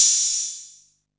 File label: Hat, Drum & Bass